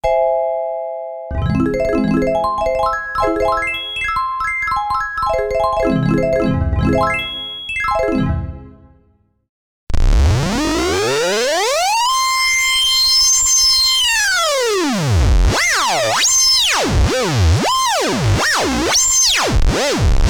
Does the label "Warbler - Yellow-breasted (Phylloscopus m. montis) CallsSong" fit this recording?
No